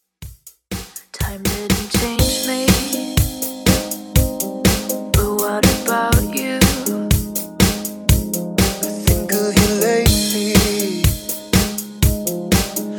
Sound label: Uptempo 122 BPM